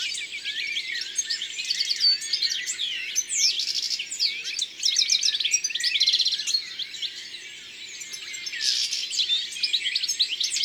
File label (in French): Chardonneret elegant; Drome; Concerts Naturels de la Drome; Studio les Trois Becs